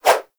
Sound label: Generic Bow Load Whoosh; Warframe; Foley